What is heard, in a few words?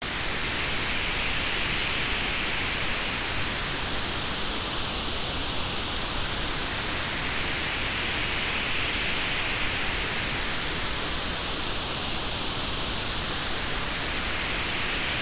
Pulsing Quiet Noise